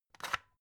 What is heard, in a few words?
electric whisk slider close; haus-garten; kueche